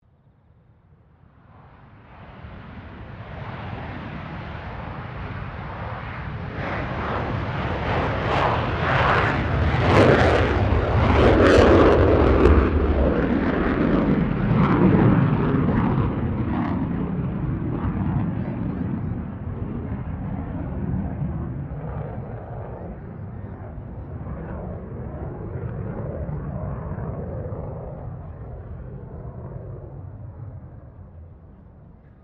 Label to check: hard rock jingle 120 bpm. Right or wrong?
Wrong